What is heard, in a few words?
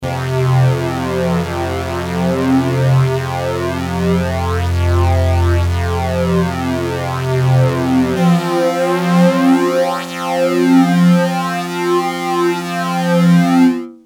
prodigy ibanez fl99